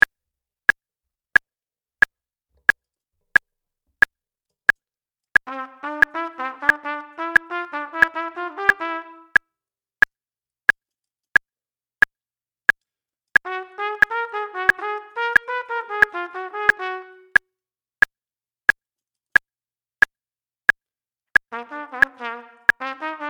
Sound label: Level faster, trumpet; Double Tonguing